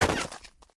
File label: Snowman Break; Lethal Company